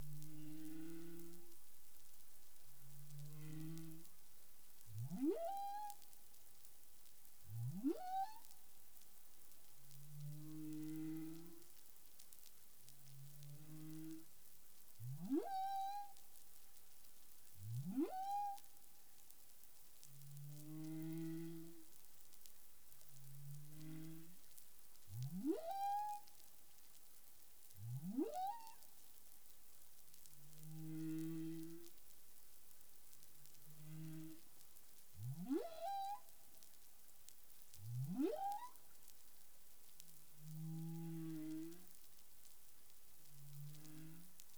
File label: humpback whale; CARIMAM